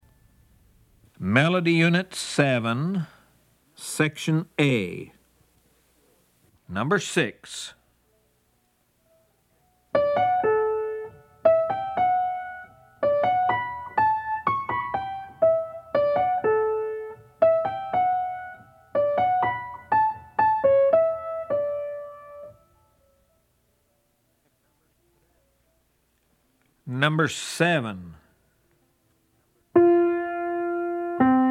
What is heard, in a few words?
melody, ear training recordings